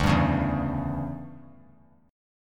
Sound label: D Major 7th Suspended 2nd Suspended 4th D down 3C 3F 3A 3D, chord; DM7sus2sus4; strummed; JGuitar